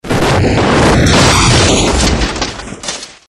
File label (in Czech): exploze